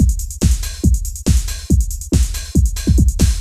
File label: techno 140